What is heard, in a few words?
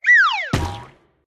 toon Falls